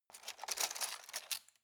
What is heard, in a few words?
cutlery plastic multiple drawer grab soft